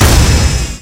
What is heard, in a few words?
attack; guns n zombies 2014 pc; Bangtower 120